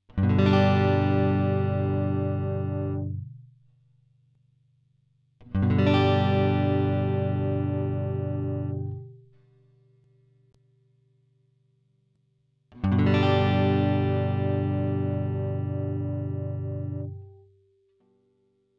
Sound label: Cream Phase HPF